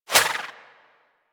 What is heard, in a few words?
Throwing Star Whizzby; Warframe; Weapons